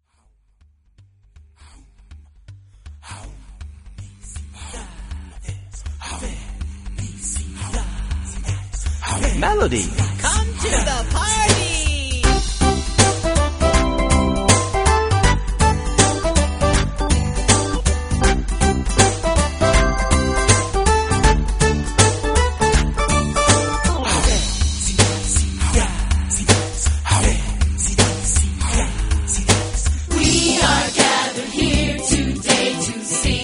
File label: melody